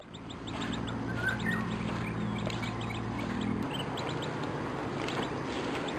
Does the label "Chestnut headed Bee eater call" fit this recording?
Yes